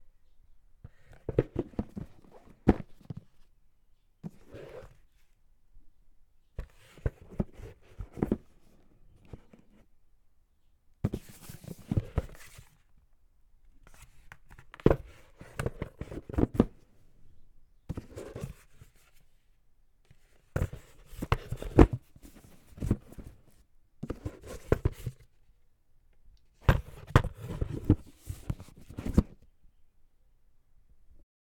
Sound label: Box Opening Closing